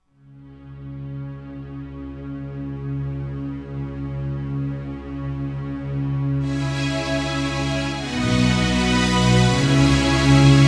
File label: eerie